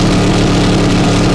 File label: LOW MID, Engine; Cars; XBOX